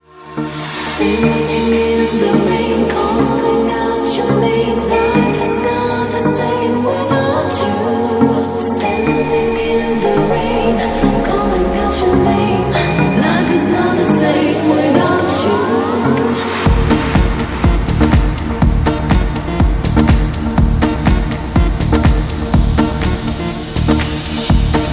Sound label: Rain Acoustic